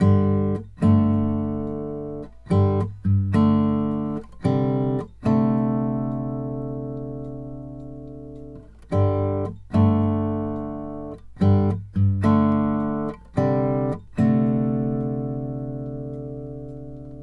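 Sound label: Country Guitar, Verse 1+2; Digidesign; Pro Tools Essential Loops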